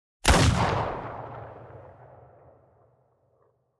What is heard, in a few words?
gunshot